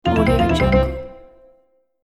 super menu finish

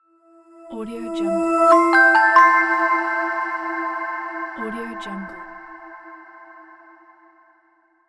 intro sound

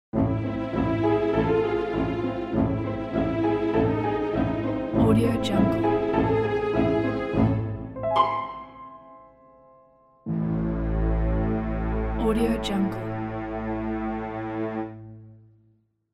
scary horror logo

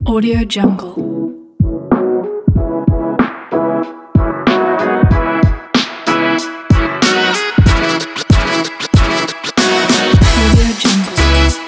hiphop; usla

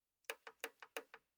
dvd player button

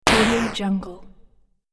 exploding game hit

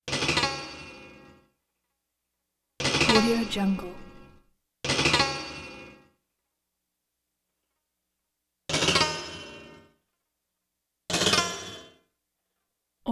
lute out of tune guitar metal string twangs; prosoundfx

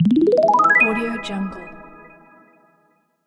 trendy audio logo

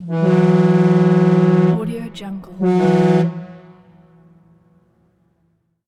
tug boat horn; ndeemax

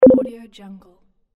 game button

